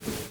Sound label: Swoosh